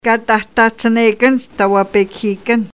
piano; contenus autochtones; sports et loisirs

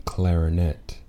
clarinet

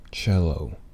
cello

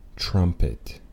trumpet

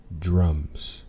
drums

